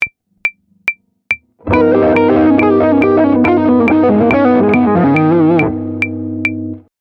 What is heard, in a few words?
Lick